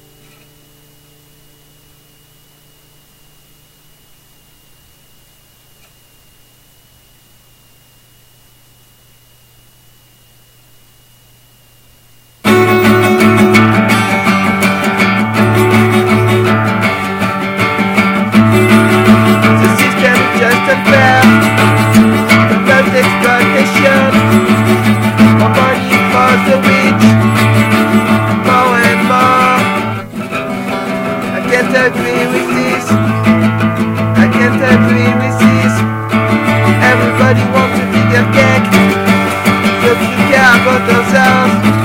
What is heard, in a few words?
Acoustic Session